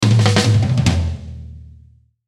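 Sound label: Musical Element, Drum Snare March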